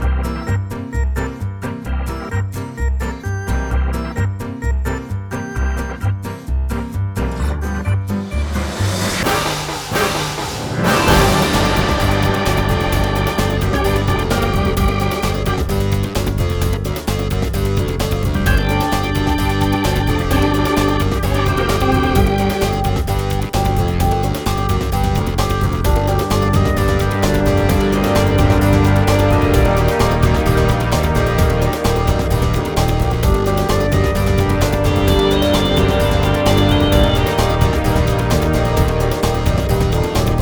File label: Frog Boss; Alien Hominid HD; Switch Windows Xbox One Xbox Series X|S gamerip 2023